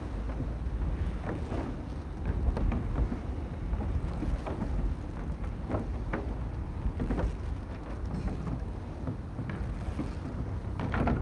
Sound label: tonalwind windmill outside stereo; xtra; Sonniss GDC